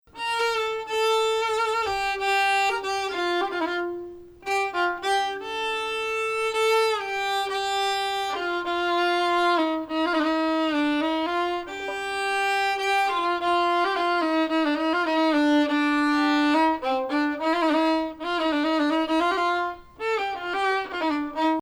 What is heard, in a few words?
Bayati Samai Ajam - Bayati